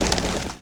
colide thing; wob2 demo

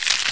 clawing; Exile III v1.0

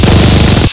Mini Gun; quake

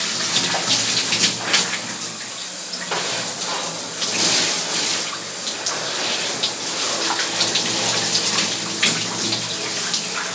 shower Loop; Singles; French Version

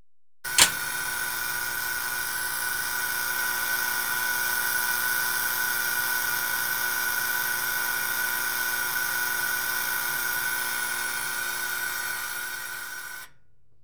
oven time

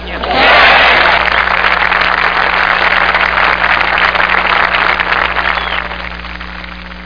clap

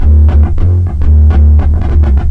Analog Guitar; Guitar&Electro

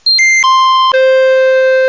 Clarinet; Amiga-CD 1996 #5-6; sampler; Instruments; Amiga Magazin

